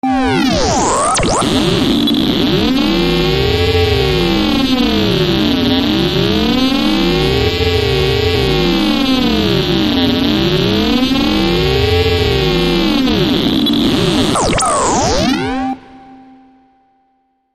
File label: Geiger Counter